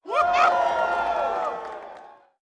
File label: tailgate cheering fan; sfx tailgate